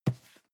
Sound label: cardboard box medium grab slide; objekte